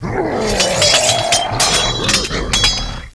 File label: catapult; APOCALYP